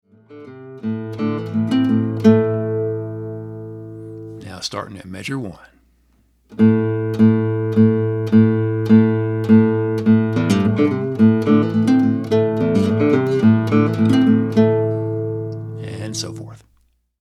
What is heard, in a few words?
muleskinner licks